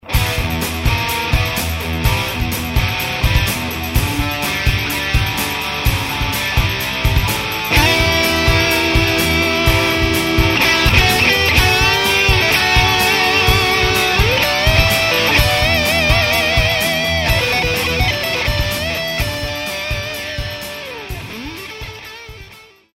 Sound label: riff